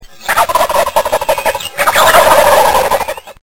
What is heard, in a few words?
gobbles